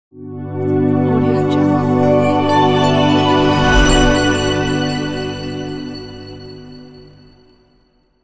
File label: ident reveal